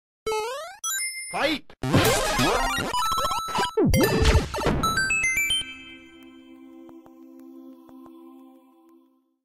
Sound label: videogame